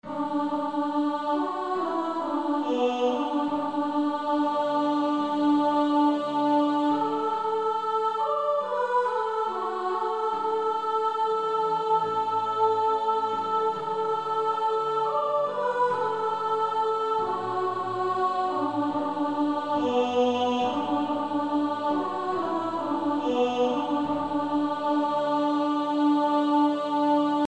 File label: Male Choir T1; 1st Tenor; Somebody’s Knocking at Your Door; with Piano